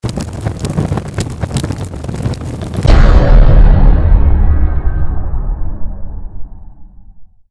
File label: girl burn; mujik